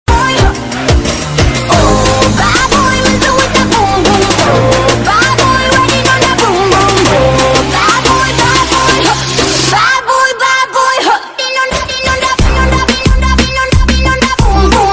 bad boys attitude ringtone english attitude ringtone bgm ringtone